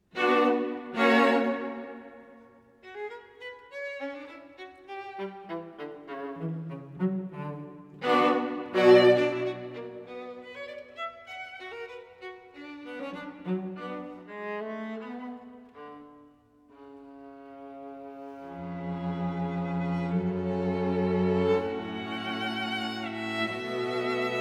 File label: String Quartet III. Allegro moderato Adagio; Beethoven String Quartet No. 14 in C sharp minor, Op. 131